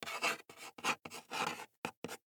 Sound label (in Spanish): ESCRIBIR PLUMA; Yesterday Origins